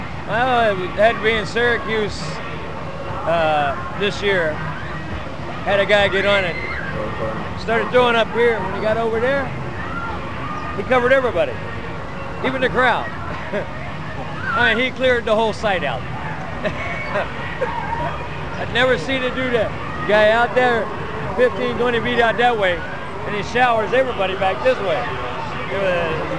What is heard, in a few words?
vomit; statefair; skydive